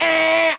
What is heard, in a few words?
ahhhhk; Voice; ooh ahh